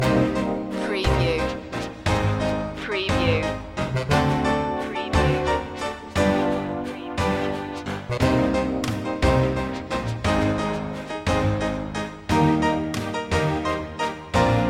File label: electric guitar waltz loop